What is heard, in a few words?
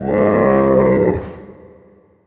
maia, maiasaur